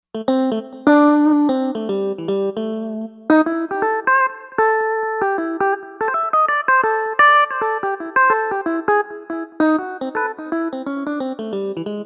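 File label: Guitar Clean Lead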